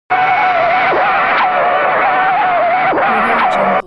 science fiction space earth radiofrequency connection